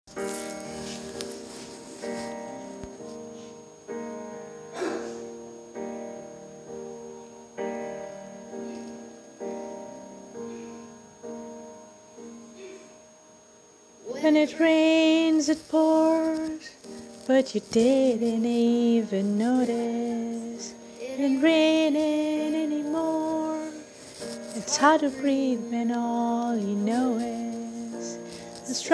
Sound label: Vocals